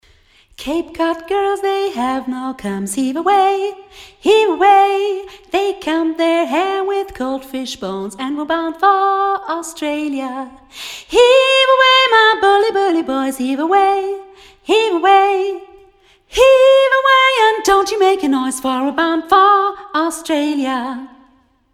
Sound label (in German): Gesang; Cape Cod; Volkslieder